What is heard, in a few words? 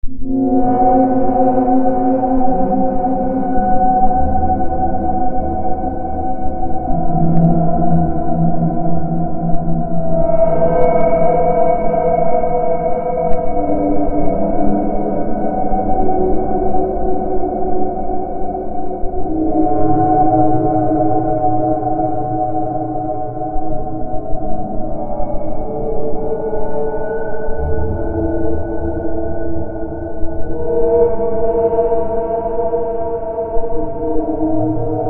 ambient